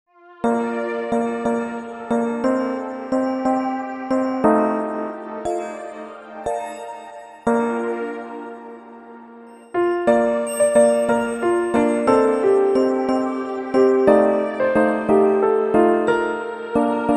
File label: Waltz